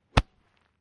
Golf Ball Hit W 3 Iron

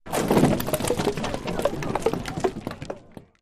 Arrows Hit Shields

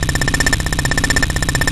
Data Scan Text Flutter, stinger

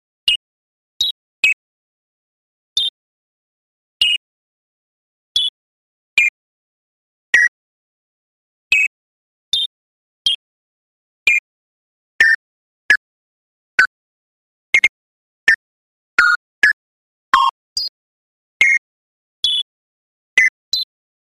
Blips Small Quick Sma

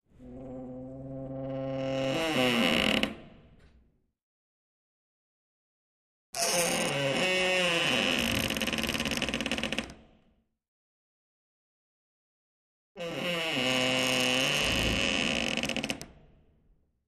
Door Wood Creak X3; hinges & creaks

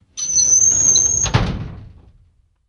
Drawer Metal Close

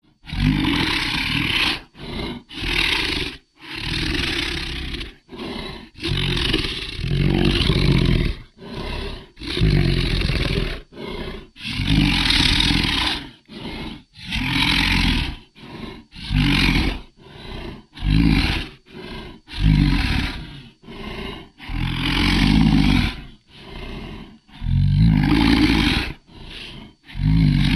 Monster Long Growls

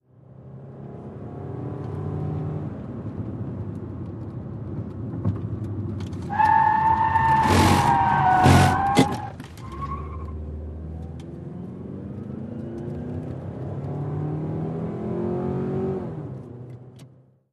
Sedan 180 Skid Mix

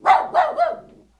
Puppy Dog Bark

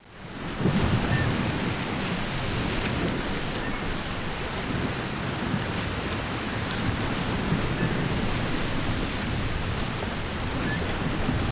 Waves Gulls Wind Beac

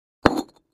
Patio Block Impact, concrete & brick

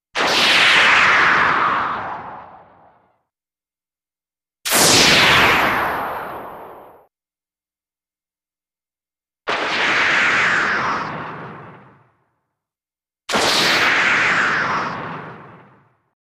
Sharp Electrical Ch

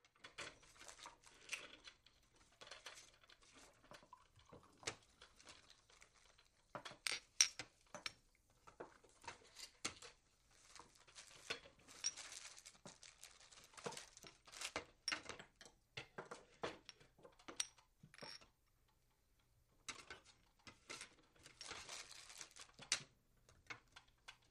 Serving Meal Variou, cooking

